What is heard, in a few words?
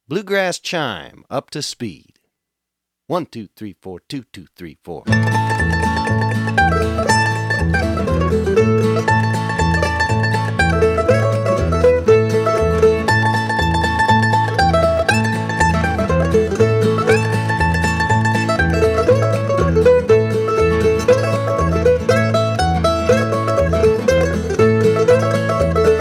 Bluegrass Chime